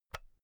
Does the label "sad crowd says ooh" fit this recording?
No